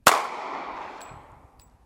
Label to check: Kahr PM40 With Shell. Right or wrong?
Right